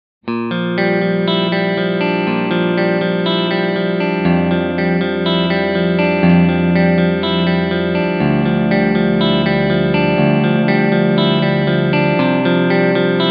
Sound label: Terror Clean picked chords Natural channel Tele